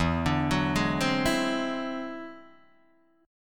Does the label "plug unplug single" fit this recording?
No